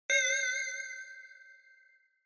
Inspiration Single Mid; Onoma

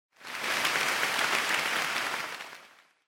Applause Short

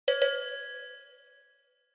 Cowbell (Reverb)

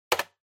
PC-Keyboard (Enter-Hard)

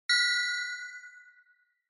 Inspiration Low; Onoma

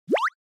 Anime Motion High

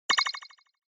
Surprise Low Short; Onoma

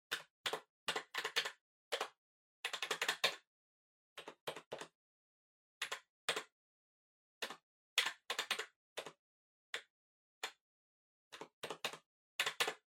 PC Keyboard Far Arrow

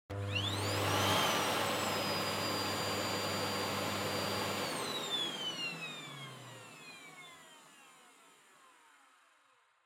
Vacuum Cleaner Vacuum Low Short

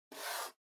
Trackpad Swipe

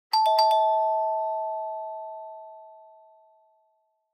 Doorbell Fake; ドアベル

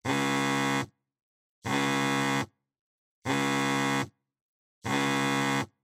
Cell Phone Vibration (Table)

